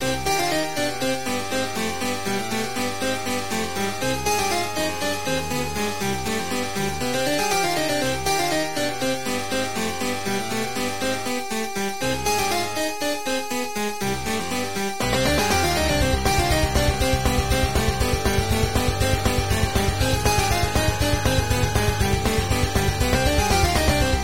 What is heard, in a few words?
Harpsichord Hybrid; Synthesizer Lead; RetroMagix; Zephyrus; Syntheway